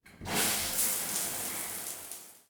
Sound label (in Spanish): DUCHA INICIO